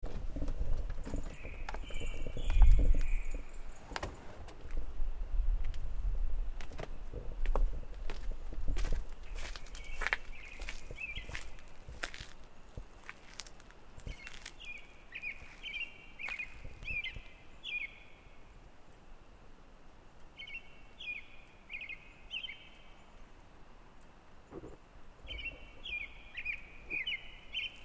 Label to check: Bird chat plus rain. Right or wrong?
Right